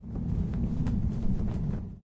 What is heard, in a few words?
angelic defeat wind; smite; thanatos